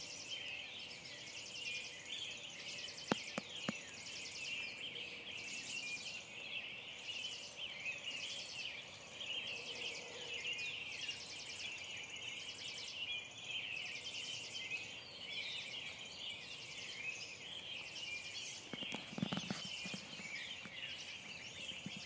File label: morning birds singing